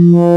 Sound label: ppg mellow saw, wavetables